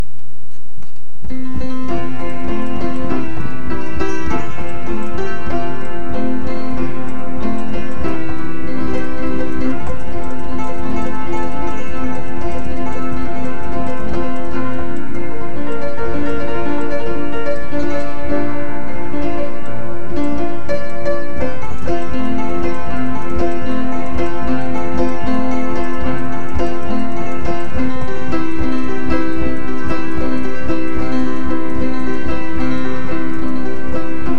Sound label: piano